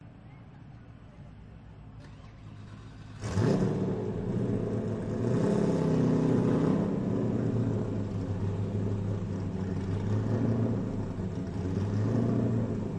pit lane ambience engine starts race car idling revving then shuts off; Sound Ex Machina